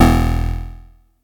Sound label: atari 520 st